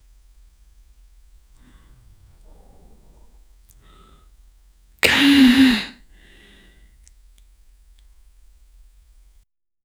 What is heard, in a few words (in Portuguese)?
beijo e gemido, intimate